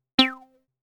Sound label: Reso, synthlead